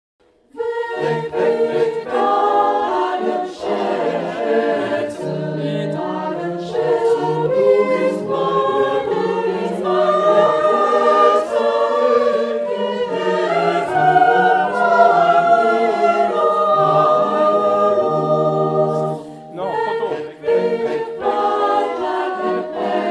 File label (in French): repetition Bach Jesu meine Freude; Bach JS; BWV 227; Weg mit allen Schatzen